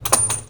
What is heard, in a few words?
Drop, INDUSTRIAL